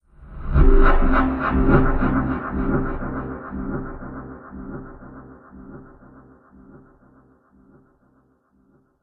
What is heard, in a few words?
Swooshy Delay Trailer Hit, Trailer Sound Effects